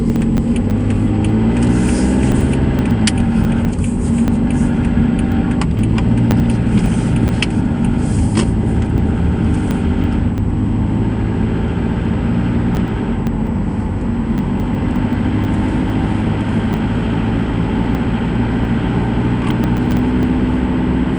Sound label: sound coming engine car sound